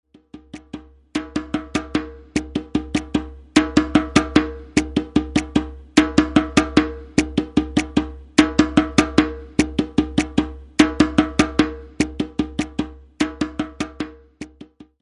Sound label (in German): Solostimmen